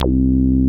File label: MOOG HI C2, ANALOG BASS; Music Store - Claudius Diemeter Schwerlos